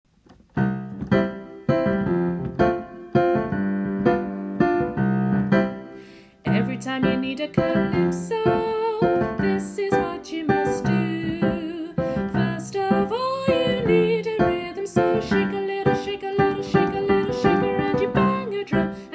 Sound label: Calypso song with example